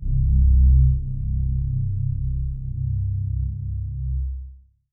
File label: Pad C, Synth Prophet One Shots; Electronisounds